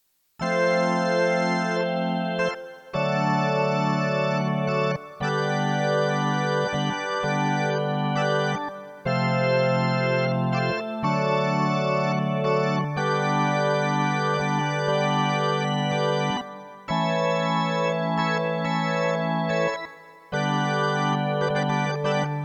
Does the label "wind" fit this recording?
No